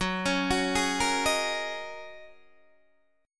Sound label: Gb 6th Add 9th, Guitar, DADGAD; chordshape; down